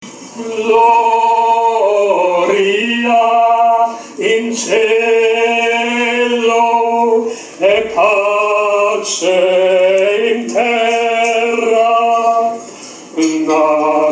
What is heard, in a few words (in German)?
Gregorianischer Gesang